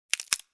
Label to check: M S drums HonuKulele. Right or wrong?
Wrong